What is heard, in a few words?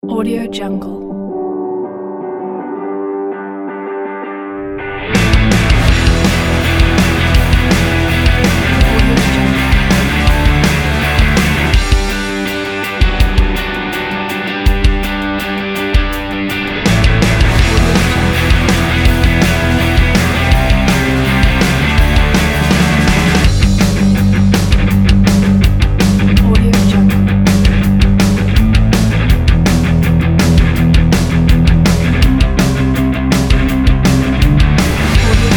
pop punk rock party